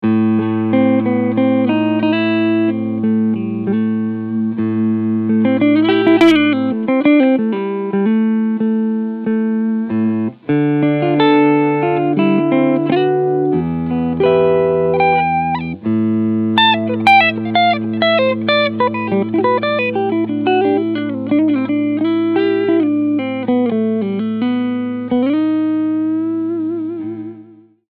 Fender Vintera 70s Tele Deluxe Cleansound Front Humbucker